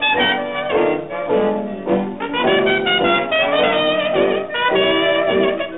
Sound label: V7 language